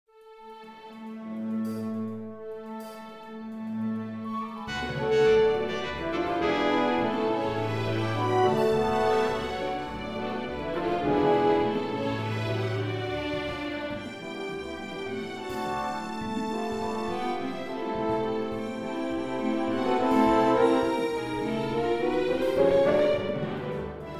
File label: full orchestra